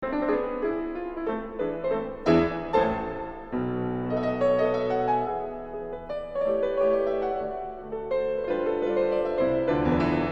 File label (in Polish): Mazurek Op56 No2